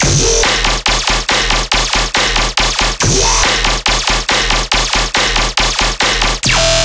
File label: Bass Loop E 140BPM, Dubstep; Moonbows Bass; Ghosthack - AC2024 - Day 13 - Dubstep Sounds 2025